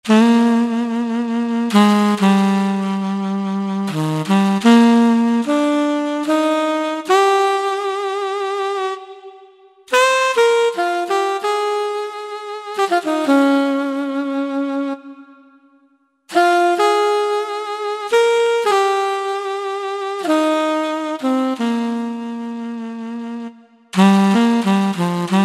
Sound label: Tenor Sax, Yamaha PSR-3000; Factory Voices; Saxophone